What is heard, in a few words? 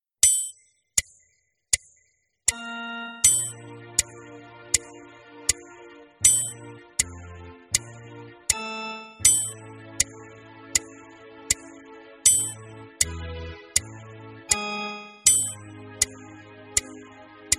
warm up exercises; Playalongs zu den WARM-UP EXERCISES; otmars warm up exercises